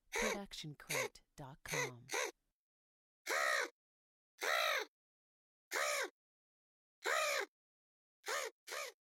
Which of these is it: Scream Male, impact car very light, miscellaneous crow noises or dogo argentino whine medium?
miscellaneous crow noises